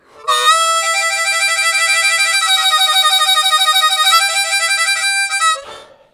Head Roll, Harmonica Riffs; Zero G - Harmonica

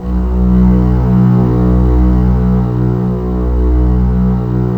STR B0; ENSMBL; AKAI S-Series CD-ROM Sound Library VOL-8

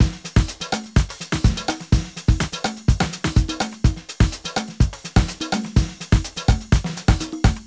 125 Beat 4 bars, Nu Jazz House; PowerFX

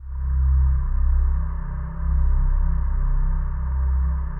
digi pad, Pads

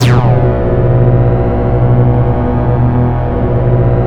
GRINDER B3; Drums In Your FACE!

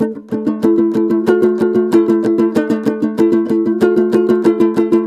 TOPSHUR; Soundscan vol.60; Asian Traditions; AKAI

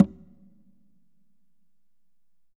Congas wet, drums percussion

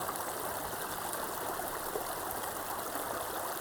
Waterway G Dark

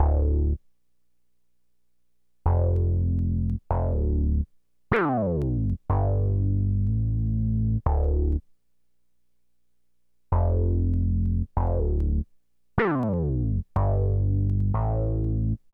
BAS, Hip Hop and R&B; Best Service ProSamples vol.02; AKAI

